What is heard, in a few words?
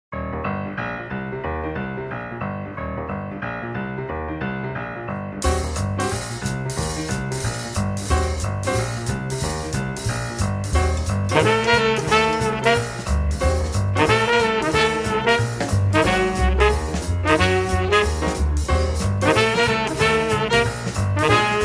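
drum boogie, swing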